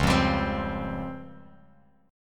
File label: C sharp Major Sharp 11th, Bass Standard; C#M#11 strummed; 9,8,17,17; JGuitar